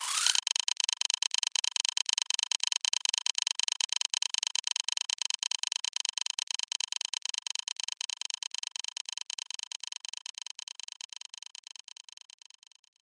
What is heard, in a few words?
Canon Target; New The Denpa Men